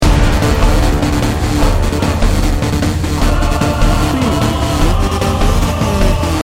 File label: from the ashes loop